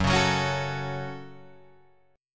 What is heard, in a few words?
F sharp Minor F sharp, Guitar Open G